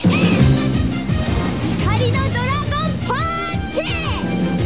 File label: punch